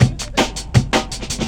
helicopta cuts, drum loops; Zero-G Jungle Warfare 1, 2 & 3

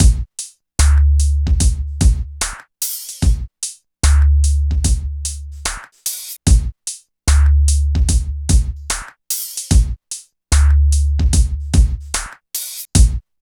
LOOP; East West - Phat & Phunky CD1; 90's Sample CDs

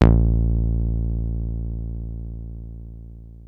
303 A1, TB 303

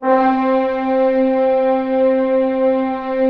orchestra C3 PP; Sound On Sound - Peter Siedleczek's Orchestra

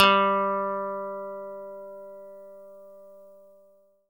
E-GITARRE, Alpine Volksmusik; Best Service

